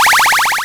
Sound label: powerup